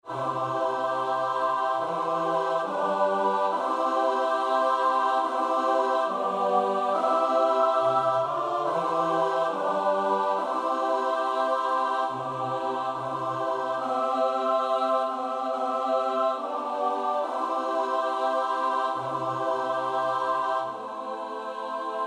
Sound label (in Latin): midi regina coeli; lotti